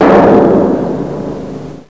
Edge Die; Casady & Greene; Crystal Crazy Demo; Bonus Wave

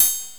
Triangle Open; XG

